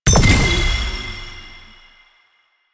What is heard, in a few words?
Gunbrella Alt Fire Notifier, Weapons; Warframe; Tenno; Koumei